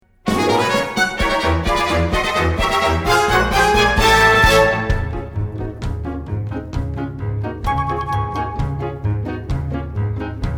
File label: audition cut accompaniment